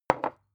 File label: glass put down tipping slow